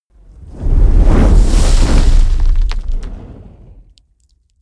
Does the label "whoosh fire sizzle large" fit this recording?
Yes